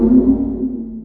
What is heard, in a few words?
hack particals level